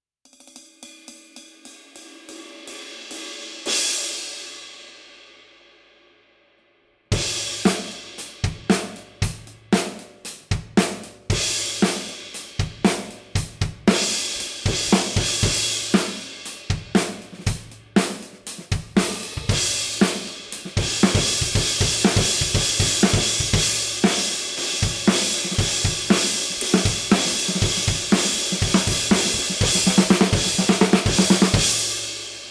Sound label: Vintage Crash